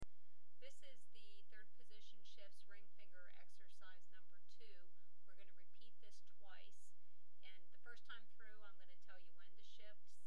Third Position Shifts Ring Finger, mandolin

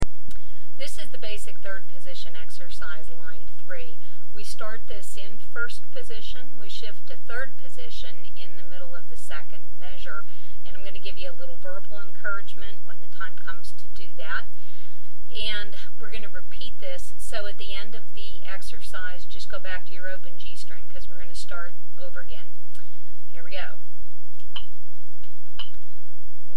Basic 3rd Position Line, mandolin

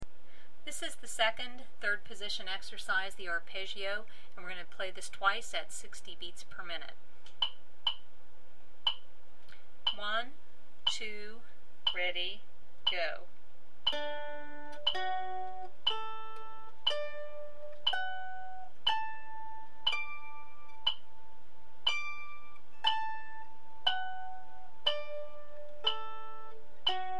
Third Position Arpeggio 60bpm, mandolin

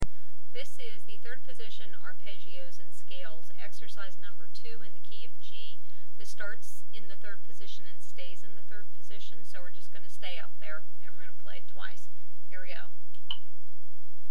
Third Position Scales And Arpeggios, mandolin

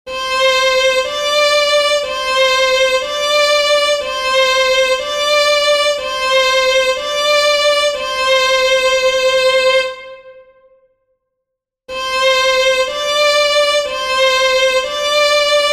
Fifth Position Exercise 60bpm, mandolin

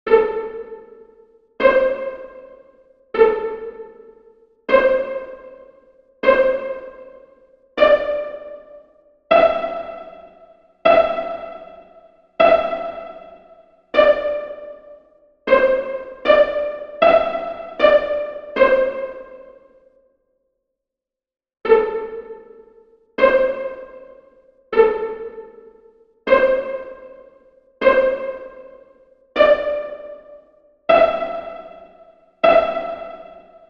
Second Position Exercise 80bpm, mandolin

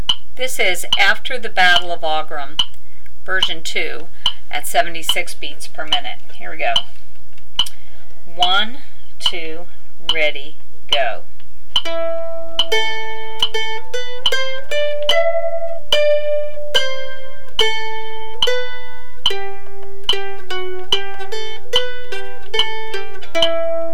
76bpm, mandolin

